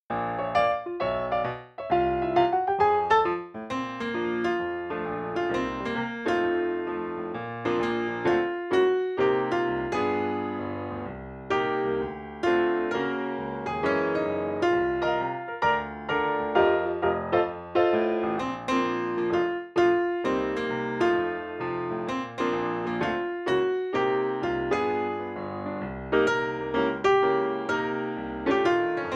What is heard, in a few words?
Melody